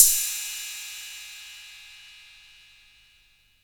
Royality free cymbal sound sample tuned to the D note. Loudest frequency: 8417Hz; cymbal D key